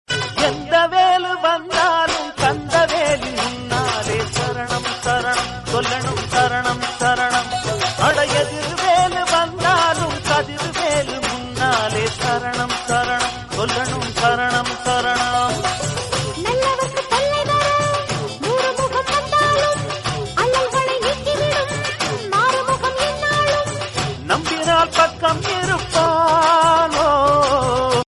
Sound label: devotional south; god murugan